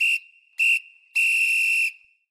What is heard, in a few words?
whistle final bonus; Fishing For Gold